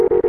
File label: error; BALLY; CORVETTE